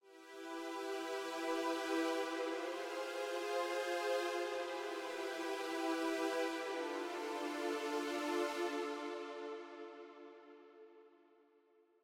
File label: test chorus